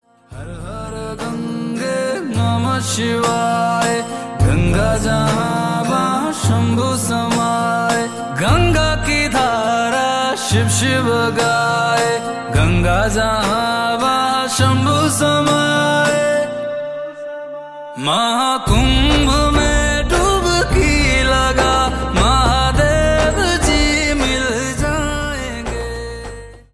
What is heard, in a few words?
Har Har Gange Namah Shivay Ringtone